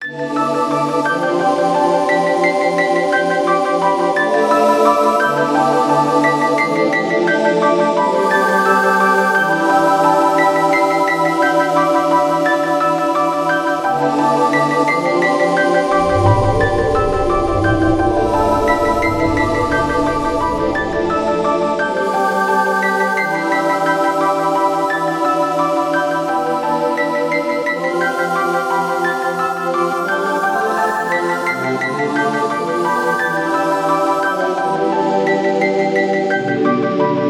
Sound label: Echo; Secret of Mana; SNES; Whisper and Mantra